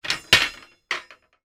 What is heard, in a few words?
household moses basket stand take down; sound effects one